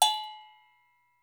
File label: AGO HIT B, Percussion; Sampleheads; NYC Percussionworks; GJ PERC VOL1